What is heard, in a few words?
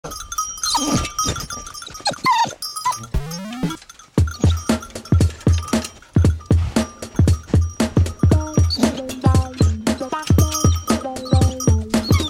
funk